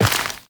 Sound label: Step Dirt, Footsteps, Walk; Warframe; Tenno